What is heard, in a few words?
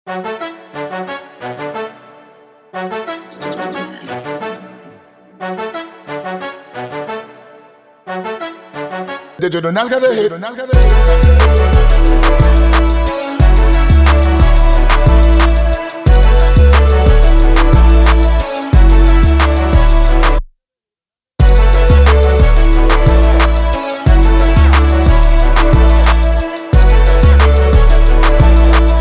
Instrumental